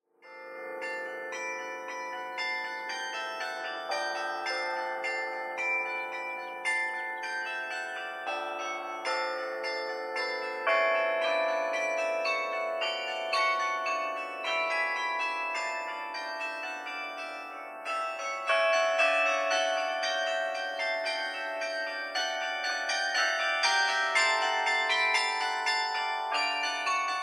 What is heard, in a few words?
carillon; Celebration on “O Sanctissima”; Range: 3.5 Octaves